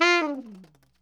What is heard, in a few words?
TNR SHFL F4, saxophones, SHORT FALLS; Greytsounds - Philip Wolfe David Crozier's Saxophones